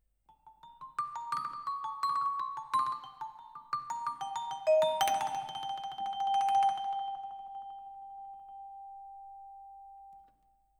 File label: Vibra, Twin F